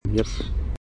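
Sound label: Common Grackle chaa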